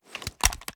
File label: reload empty end